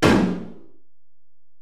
Tom, Alesis DM-PRO, Drum Machines, Toms

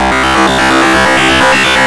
GRUNGE

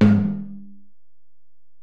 Tom, Alesis DM-PRO

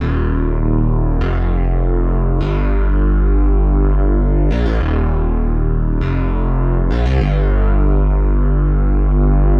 G Bass Wide League, 100; Zero-G; Future Wave

